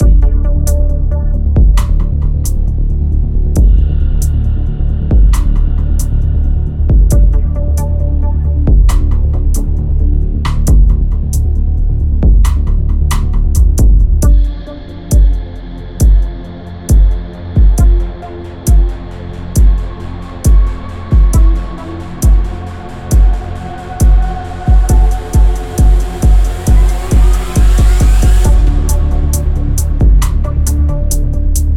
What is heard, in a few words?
Tense; Everspace 2